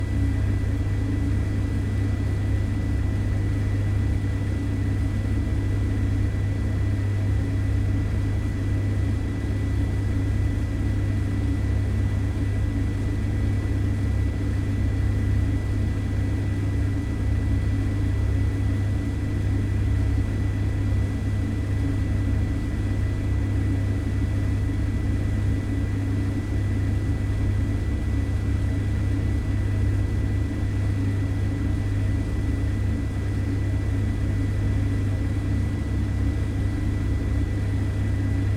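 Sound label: Hvac, Cooling unit, Refrigerator, Interior, Drone, Rattle, Roomtone, Loop; Hzandbits; Hvac Elements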